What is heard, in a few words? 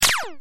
laser